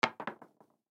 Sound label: Dice Two Roll, Dice Two Roll Hit Settle Felt Table; Dice Roll Hit Backing Settle Felt Table; Sports; Blastwave